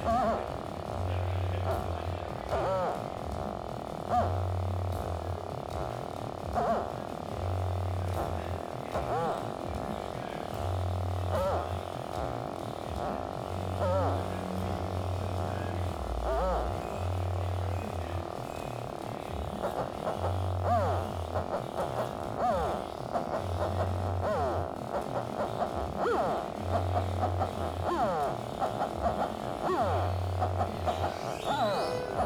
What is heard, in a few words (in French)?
theremin